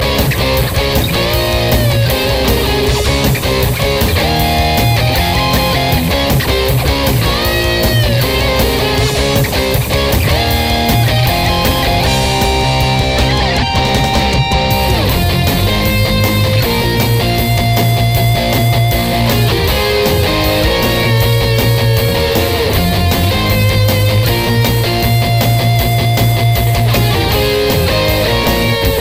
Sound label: Metal